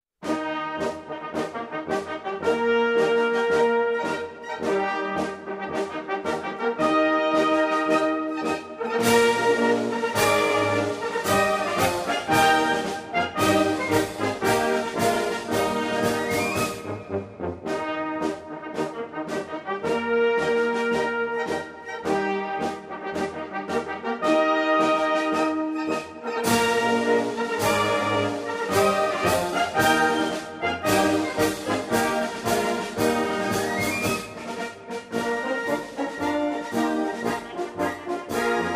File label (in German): Blasmusik aus dem Alpenland